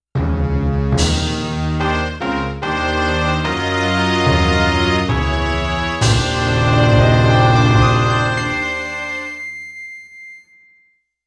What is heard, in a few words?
Boss Defeated